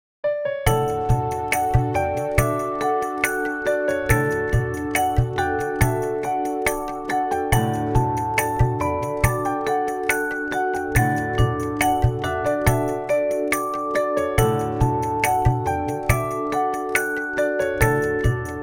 Indian Festive Vibes

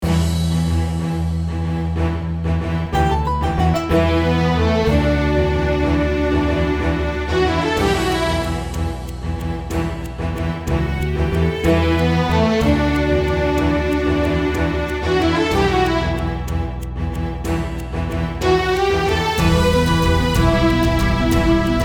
Greek Orchestral

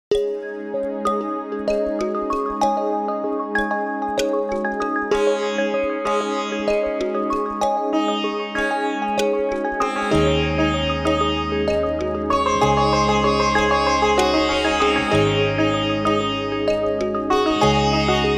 Indian Classical Rain Raga

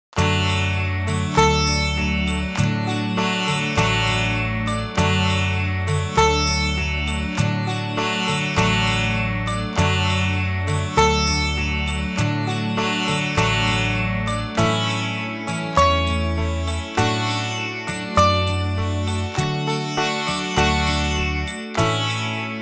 Indian Classical Devotional Music

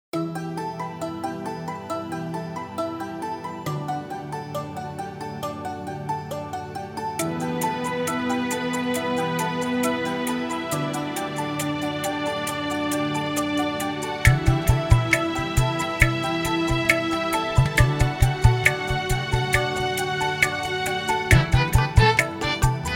Indian Traditional Climax Music; indian devotional music

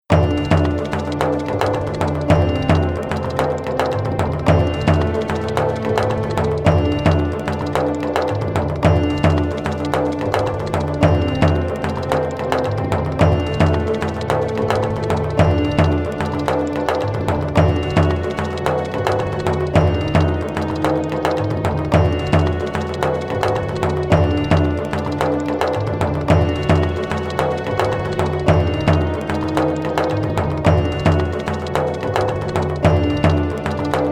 Indian Traditional Action Music; indian devotional music

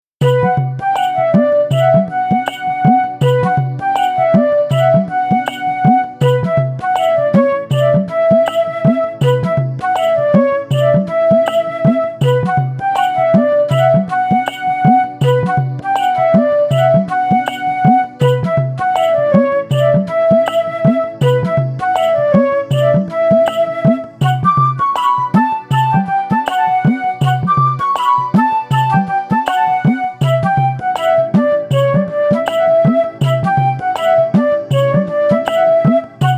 Soothing Indian Traditional Flute

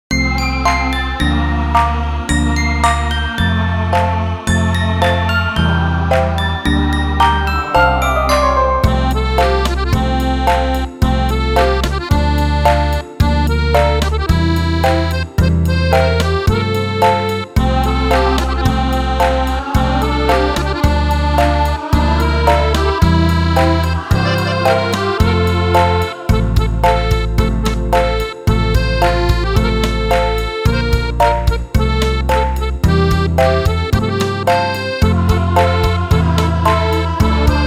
Jingle Bells Instrumental

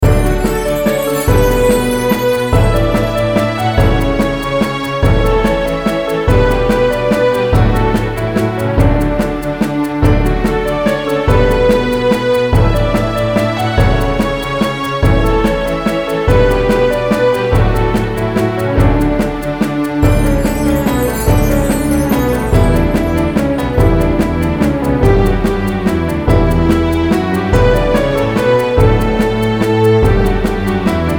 Waltz Orchestral Music

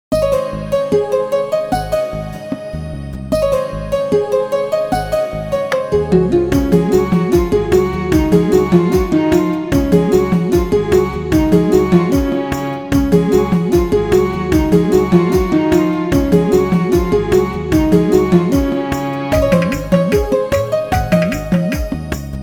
Indian Countryside Music

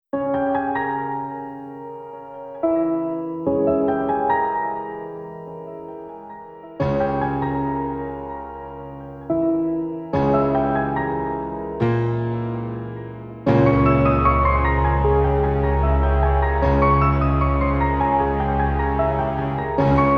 Indian Dramatic Music; indian dramatic serious music

